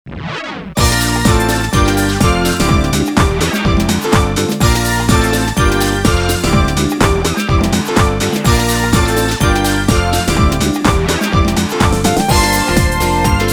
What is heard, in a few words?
Look Out ~ Instrumental Version; EZ2DJ Platinum Collectors Edition